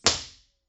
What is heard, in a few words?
impulse response; Corso acustica